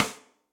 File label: Snare Sidestick Deep Snare Dynamics; Drums; Drum Hits; Standard Hits; Ableton; Session Drums Club